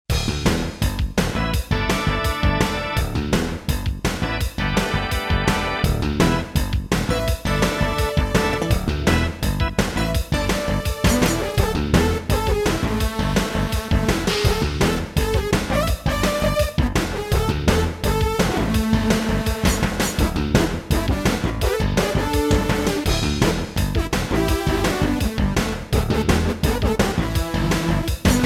Roland D-10; Factory Demos

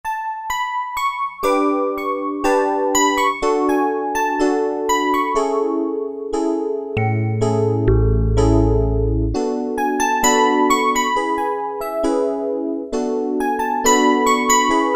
High Tiney Piano, Roland MKS-70

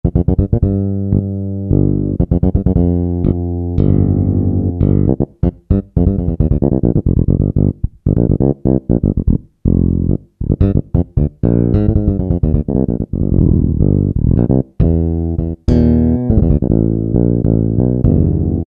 Jazz Bass, Roland SR-JV80-01 Pop

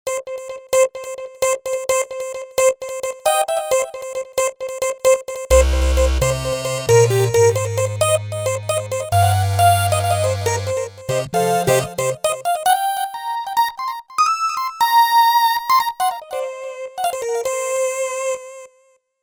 Touch Lead, Roland JV-880; Factory Patches

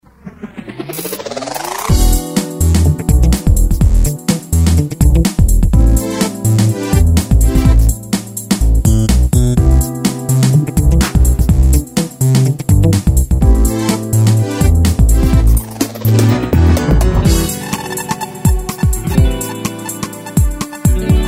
Phunk, Yamaha YPT-400; Factory Songs

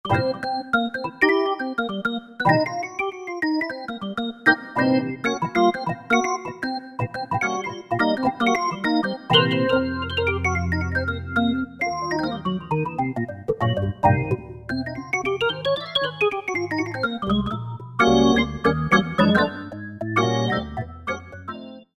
Jazz Organ, Roland SR-JV80-01 Pop

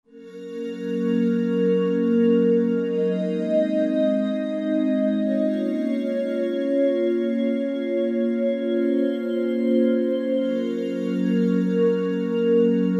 Glass waves, Roland JV-2080

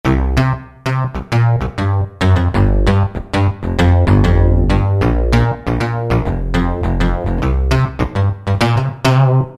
Hopper Bass; Roland PN-D50-04

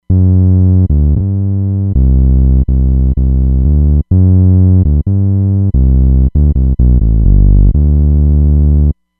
R&B Bass; Roland Fantom XR

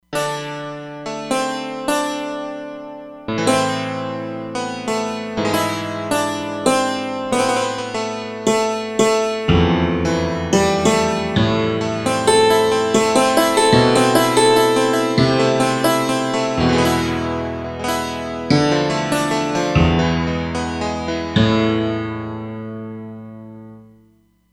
Dulcimer, E-mu Proteus-3; Factory patches

